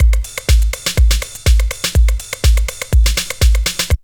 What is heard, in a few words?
break; Zero G - Man Machine